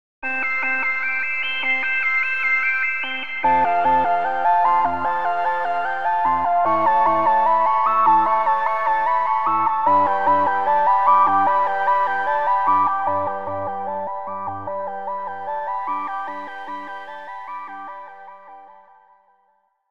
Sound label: Pads